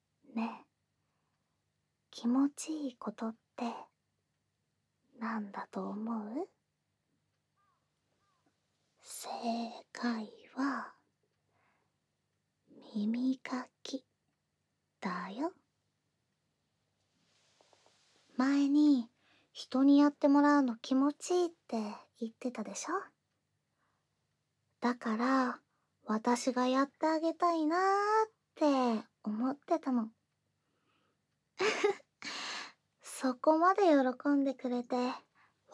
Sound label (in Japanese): 耳かきで癒してあ・げ・る; 私の耳かきで癒してあ・げ・る